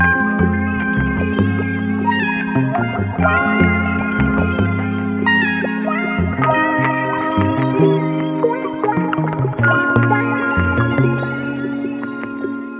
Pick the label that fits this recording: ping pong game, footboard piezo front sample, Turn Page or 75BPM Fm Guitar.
75BPM Fm Guitar